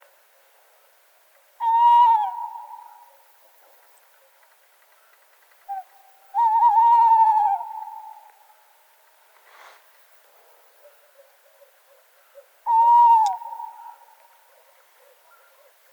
call, tawny owl, Strix aluco, Background: barking dog.; Average interval between calls: 15.0 s